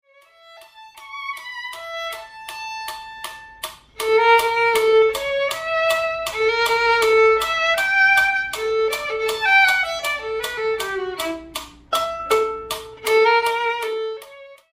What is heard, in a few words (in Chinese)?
小提琴; 示範演奏; 賽滋 第四號學生協奏曲 第三樂章; 新版 篠崎小提琴教本 第三冊